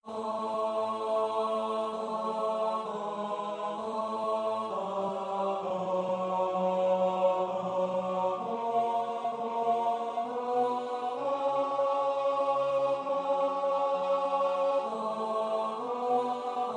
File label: Psalm tenor